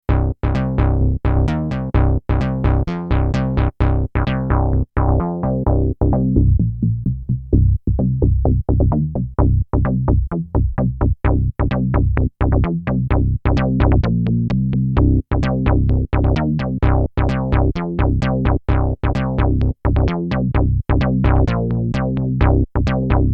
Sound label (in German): 360 Systems Midi Bass Pro externes Filter